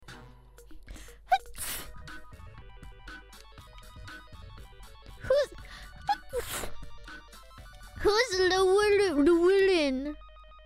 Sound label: Sneeze